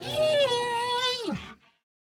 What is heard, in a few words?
Death